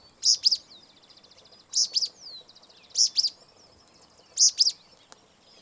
Pijui cola parda; Ceibas

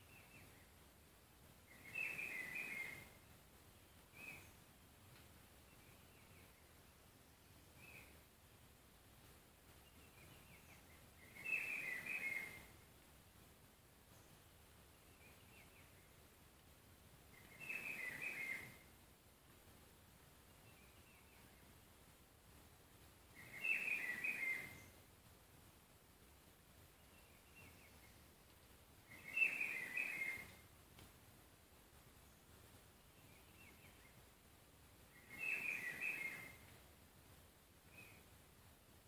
Cucarachero pechigris

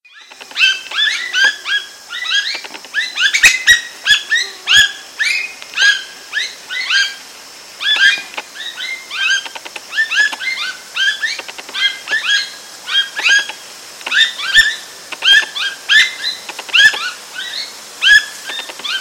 charata, Charata (Ortalis canicollis); ColTacuar

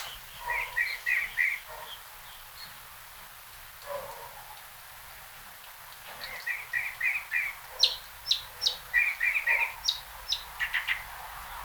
Calandria Mora

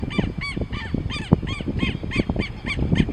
tero

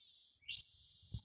inambu silbon

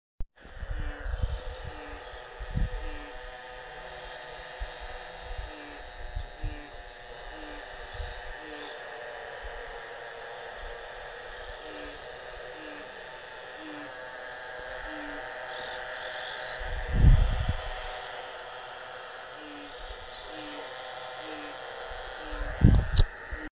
varias ranas Dermatonotus muelleri

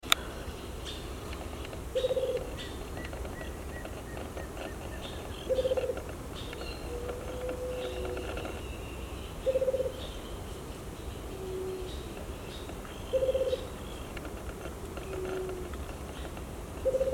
yeruva

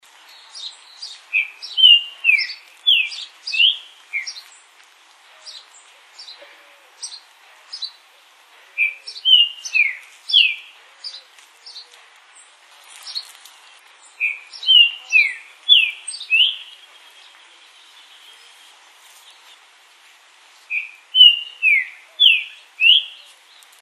Pepitero Verdoso

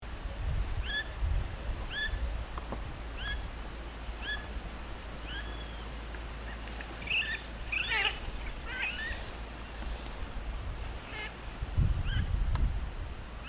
overo, Pato Overo (Mareca sibilatrix)

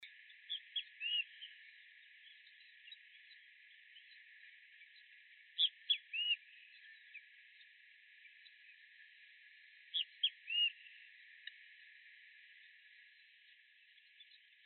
benteveo comun, Great Kiskadee (Pitangus sulphuratus)

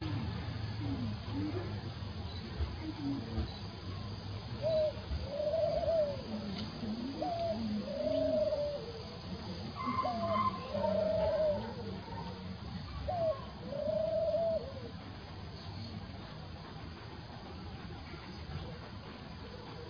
Streptopelia, Tórtola Rosigrís (Streptopelia roseogrisea); 25 CM aproximadamente

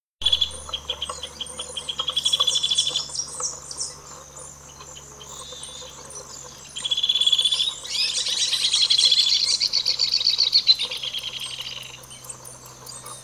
Hornerito copeton; Merlo

